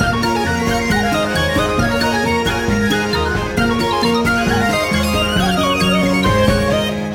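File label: jackpot ambience loop; irish pot luck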